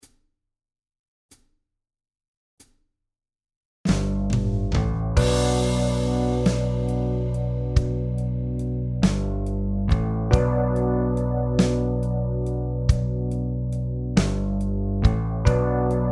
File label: NO Atmopherics NO Announcements, backing; backing tracks